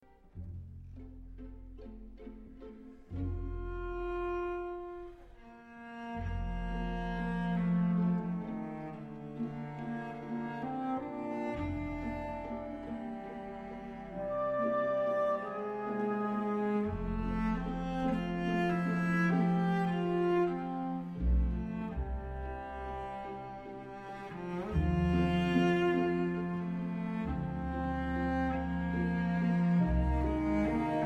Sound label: cello; Concerto en la mineur de Schumann; Reine Elisabeth